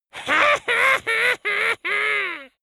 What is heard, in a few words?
dwarf laugh evil